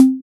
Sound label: Metronome Sound; keplers laws